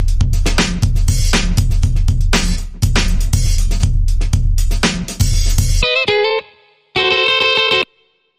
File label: Serious Whimsy Percussion Bumper Reversed